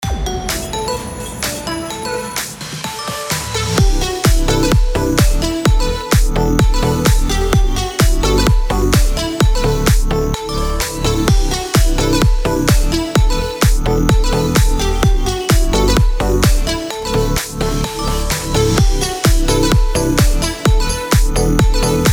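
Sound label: HOLIDAY HOUSE E minor 128BPM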